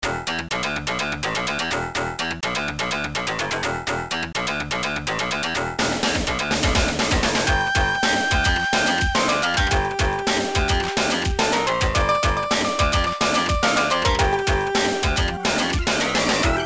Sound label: toxitown blues; world 03